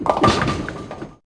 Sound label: Bowling Strike; Effects; Scratch